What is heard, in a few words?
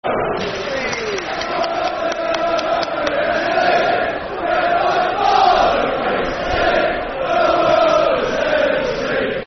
Football Chant (Soccer Song), Brighton; Brighton And Hove Albion Fc; Brighton Bhafc